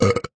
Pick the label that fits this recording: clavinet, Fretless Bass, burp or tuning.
burp